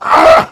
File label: heavy laugh evil